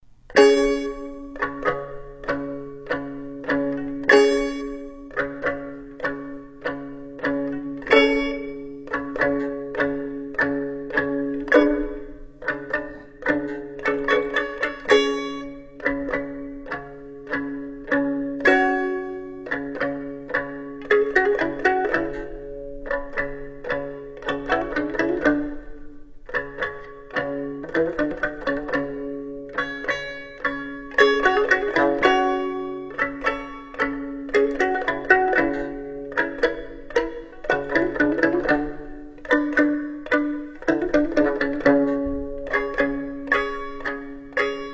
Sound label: KAMANCHEH